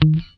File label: GUI D3#, E-GUI MUTED; Sound & Vision; Gigapack I CD 2